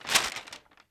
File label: item recipe drop world